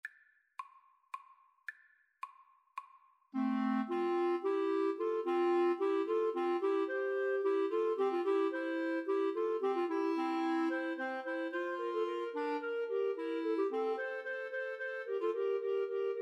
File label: Clarinet Trio